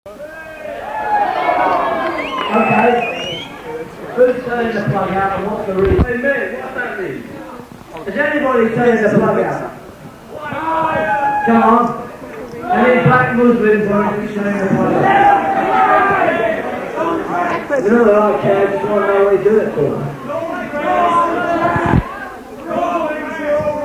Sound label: crowd banter - tuning